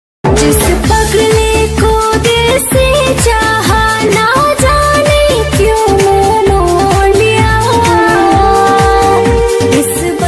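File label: bhojpuri ringtone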